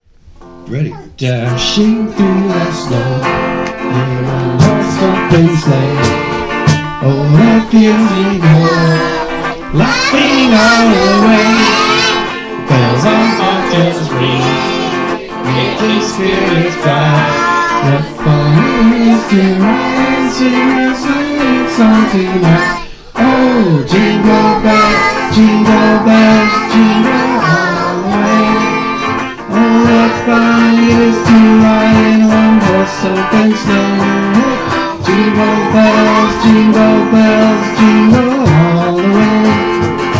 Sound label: Jingle Bells